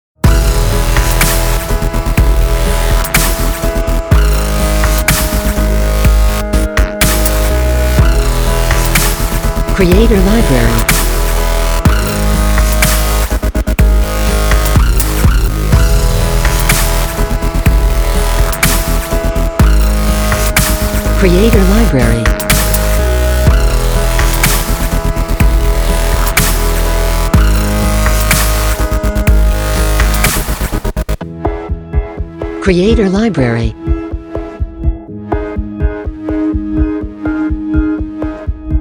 Epic, cinematic music

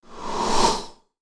Short Swoosh

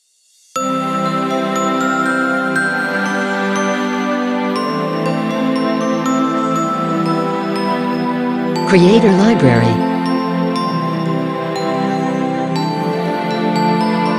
Christmas Logo

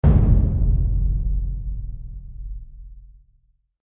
Trailer Hit

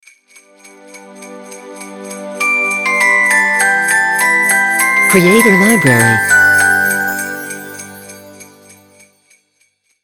Deck The Hall Christmas Jingle, logo idents

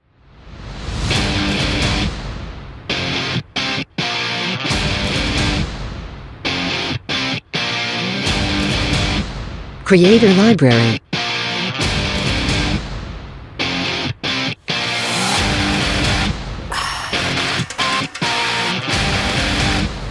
Extreme Shot, electronica music

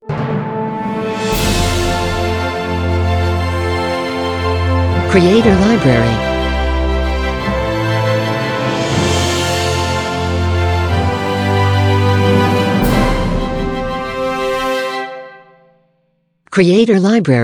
Dramatic Logo; Logo-Idents

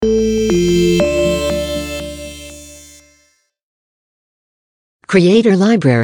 Audio Logo

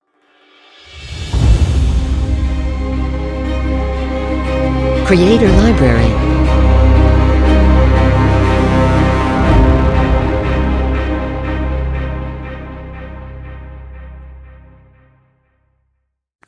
Dark Orchestral Logo; Logo-Idents

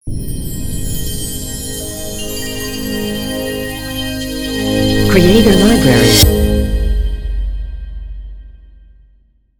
Particles Logo

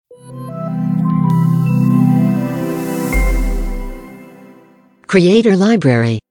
Elegant Logo; Logo-Idents

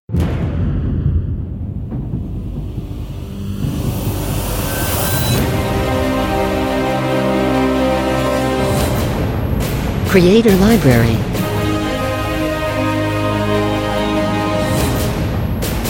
Blockbuster Trailer

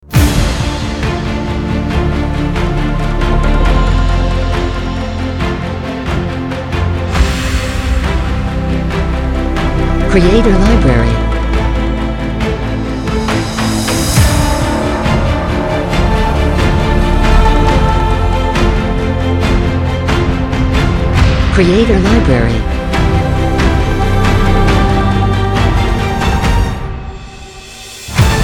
Epic, cinematic music

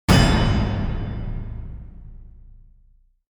Trailer Hit

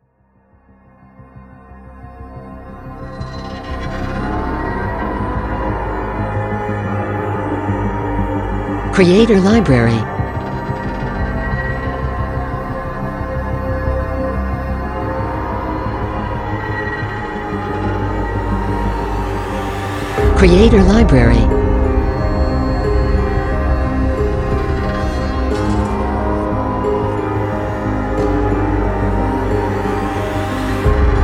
Horror

